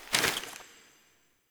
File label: Collectable Note Pickup; Objects; Pickups